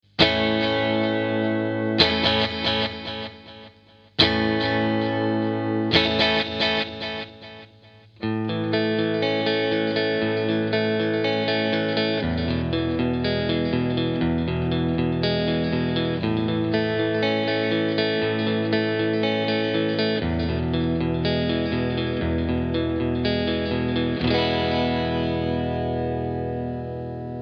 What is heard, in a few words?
boss dd 3